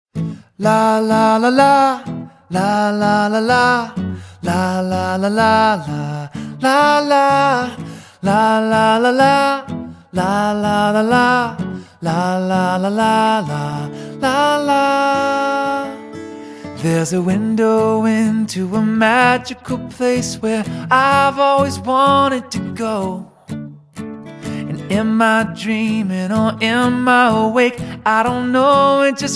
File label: Acoustic